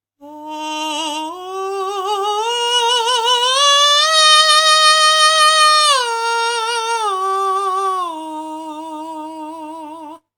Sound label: falsetto